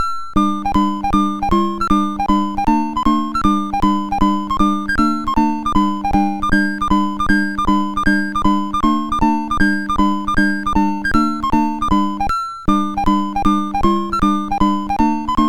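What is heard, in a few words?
Maxi Golf, MOS 6581 SID chip music, games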